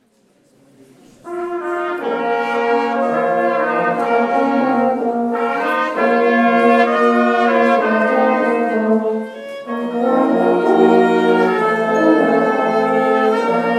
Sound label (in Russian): Духовой оркестр; Ростов-на-Дону; Молодежное общение